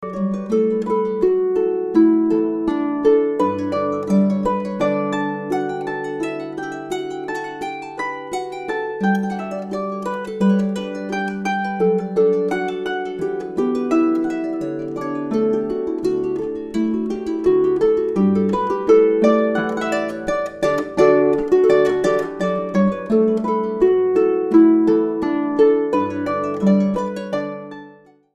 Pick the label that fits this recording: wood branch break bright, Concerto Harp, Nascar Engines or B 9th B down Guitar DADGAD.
Concerto Harp